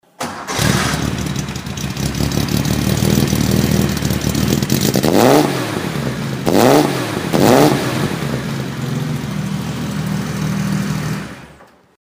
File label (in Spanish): Porsche 911 Amarillo